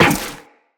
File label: Step Zipline, Footsteps, Walk; Tenno